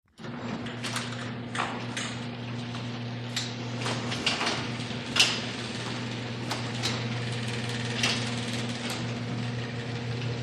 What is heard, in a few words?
Garage door, electric, close & open.; DOORS VARIOUS GARAGE DOORS; genie